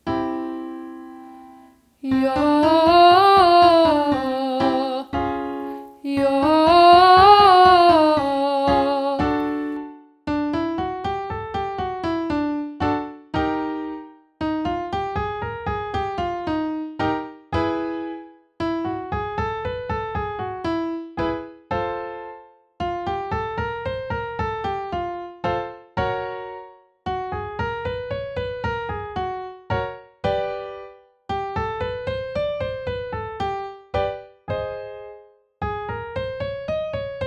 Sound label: 5 note yah ascending, vocal exercises